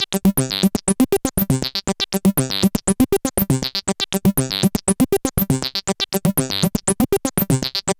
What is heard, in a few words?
Synth Sequence; Sonic Foundry (Sony Creative Software); Prototechno